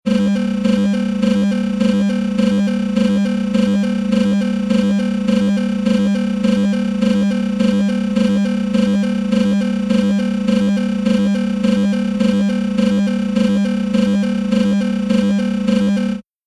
Future Telemetry Long Computer Processing Background Sound Scifi Digital Interface; Sound Design; Robot